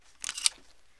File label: rem870 insert, shotgun; weapons; garrysmod